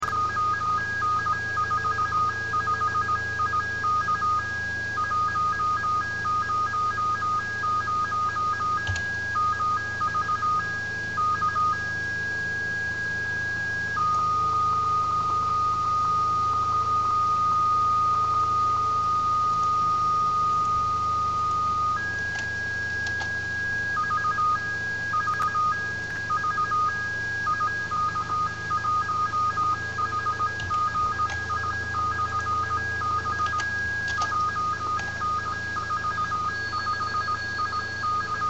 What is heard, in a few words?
beacon